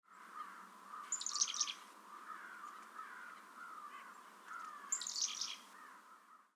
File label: Black-capped Chickadee Gargle Calls